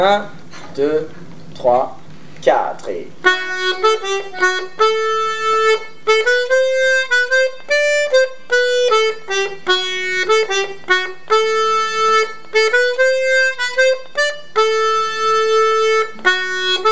Bourrée tempo lent; Avant de s en aller

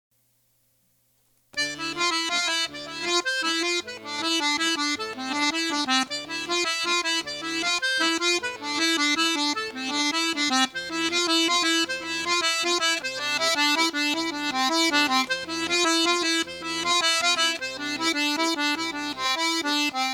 valse arpeges complexes

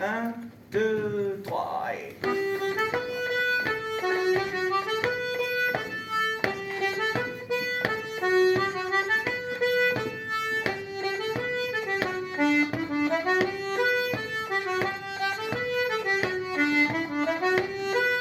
voix 2 en mi violon; Musique d-ensemble

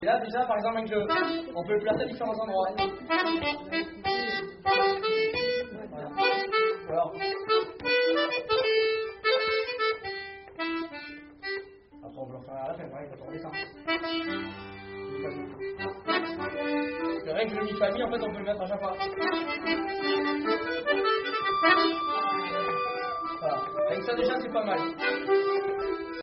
Polka ornements; Polka ecossaise

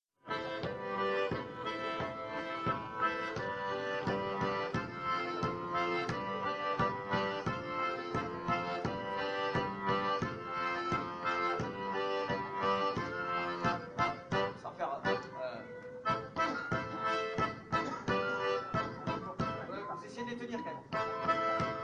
Andro Accompagnement diatos; Nouvel Andro; Musique d-ensemble

